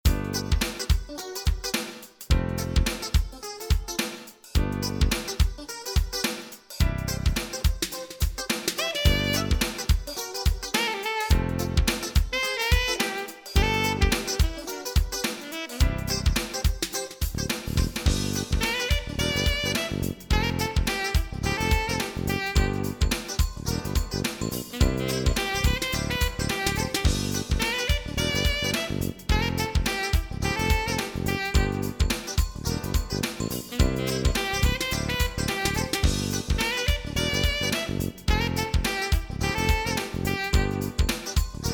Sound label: bgm